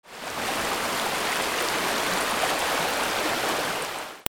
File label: WATER